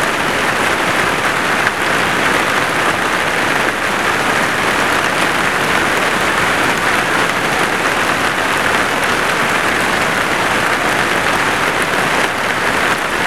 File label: Amb Weather; Red Orchestra Ostfront 41-45